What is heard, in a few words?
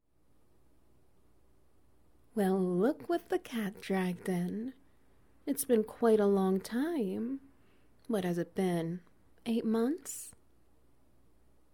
Wet Noises; Getting Even